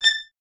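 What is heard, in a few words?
Violins A 6 staccato left; Miroslav Vitous; String Ensembles; 11 Violins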